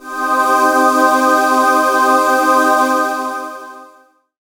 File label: pad, Dance Synths; Best Service ProSamples vol.37 - Dance Synths